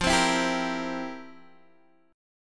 F Major 7th Suspended 2nd Suspended 4th, Guitar, DADGAD